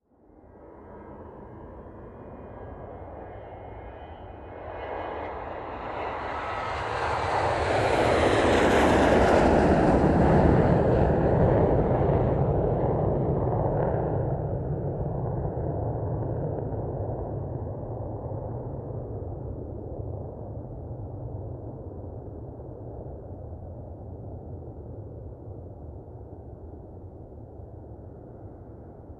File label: Airplane on a runway take off